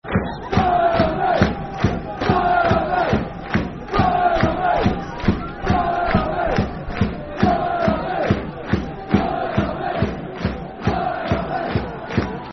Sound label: Black And White Army, Football Chant (Soccer Songs); Darlington Dfc